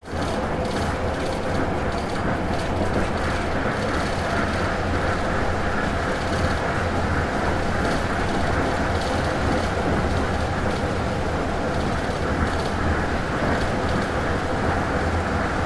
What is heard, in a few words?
monorail driving